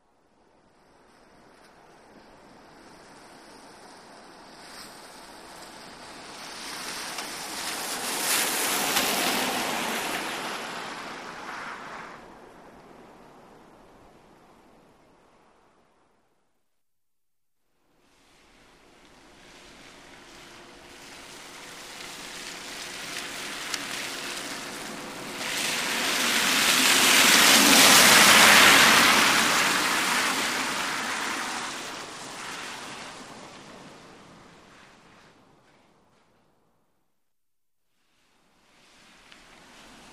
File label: Wet Bys Slow By On Wet P, wet traffic